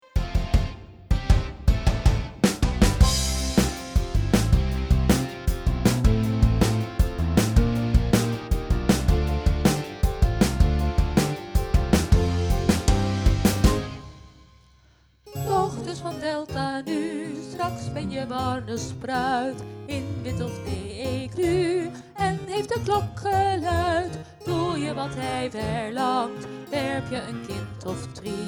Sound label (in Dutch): midden stem; musicalklas jeugd